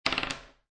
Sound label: Dice Throw One Die On Wood Table; Dice Sound Effects; Casinos